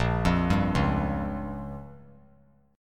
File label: A sharp Minor 6th; 10,12,10,11; arpeggiated; JGuitar